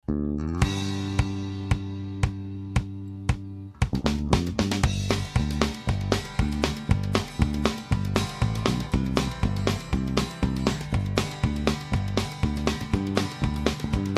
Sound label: delay mix out in; audio examples from the book